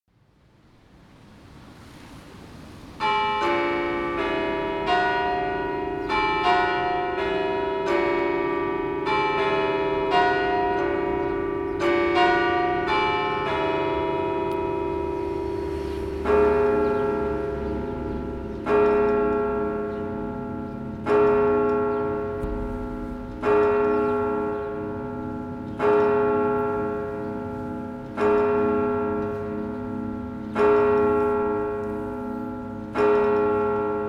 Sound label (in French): Moudon heure midi; campanologie